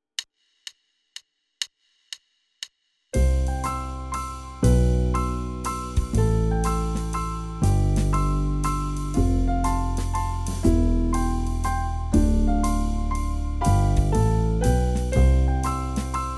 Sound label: accompaniment only